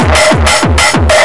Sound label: Gabba; Guitar & Electro